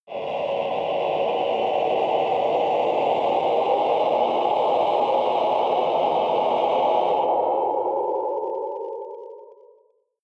evil choir cluster low; risers

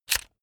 electric drill template slide out fast; soundpool

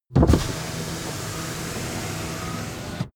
car window front open full

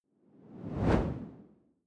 whoosh simple low; swooshes-swishes

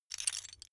metal pieces clatter short

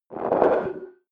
notification muffled distortion ring; kommunikation; signale

